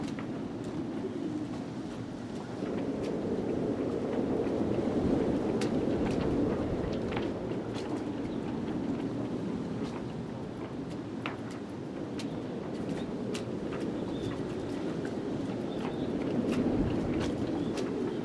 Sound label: Water Shore